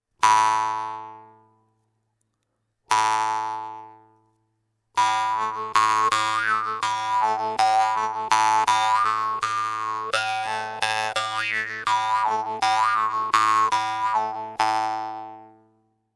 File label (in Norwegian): Munnharpe